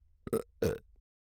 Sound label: burp; OPENAIR; Anechoic